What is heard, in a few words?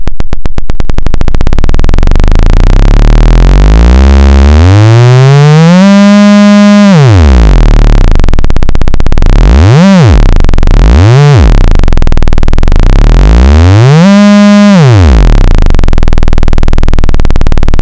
behringer cu1a